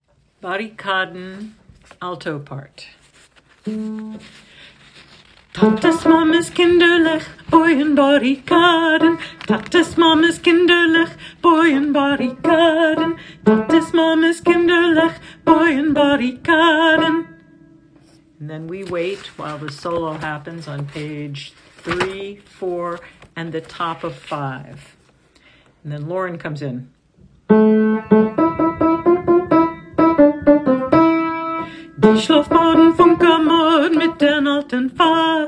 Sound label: alto part faster